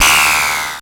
Multimedia Internet CD-Rom Flash Animation

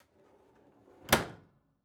Dishwasher Door Close; household

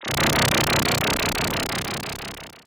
Science Fiction Sci-Fi Electronic Warble

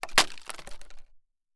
Impact Wood Debris On Wood; Impacts

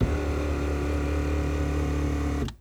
Auto Nissan Altima Seat Move Forward A Little; Transportation

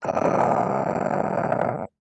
Animal Mammal Carnivore Cat Domestic Cat Growl; animals; AdobeCC

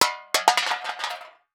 Household Pan Tin Drop On Cement

